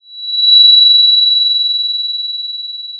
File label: pop, Sound of a phone ringing